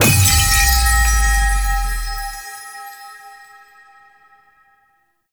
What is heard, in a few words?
Void Tear Open; Warframe; Cephalon Weave